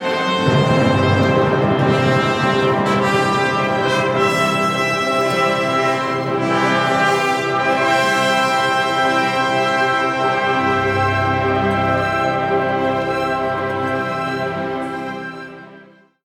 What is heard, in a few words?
sword motif, leitmotif